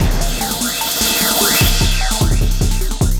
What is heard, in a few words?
Cym Cresh Ending Beat, Drum N Bass; Sonic Foundry (Sony Creative Software); Knutrix Drum & Bass